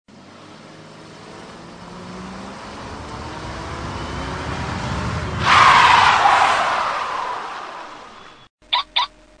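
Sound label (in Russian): zvuk mashiny i schelchok signalizatsii, car sound and alarm click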